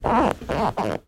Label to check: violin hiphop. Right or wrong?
Wrong